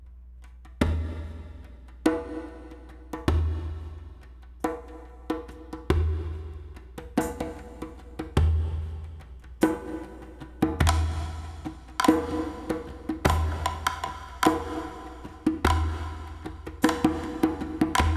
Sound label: Shaman beat